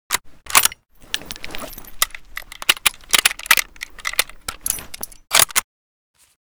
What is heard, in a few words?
mosin unload, weapon